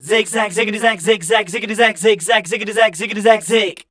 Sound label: scat, voice